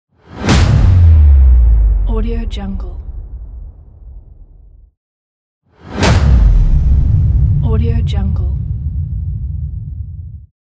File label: big switch